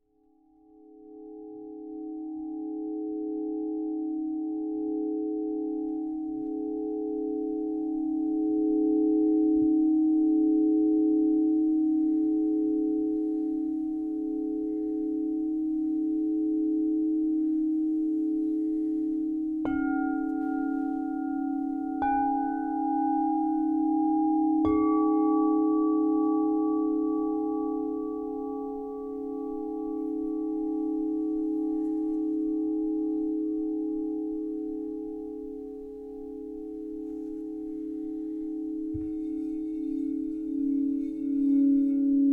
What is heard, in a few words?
Harmonised Healing Set, crystal singing bowls